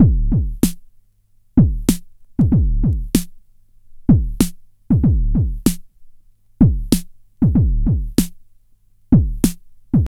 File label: 33 DOOM BOOM 95.2 bpm, Loops Breaks; POTBreaks